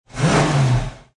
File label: Mustang 68 Fastback Rev Engine Perspective, Car; Car Sound Effects; Cars (Sound Effects:Vehicle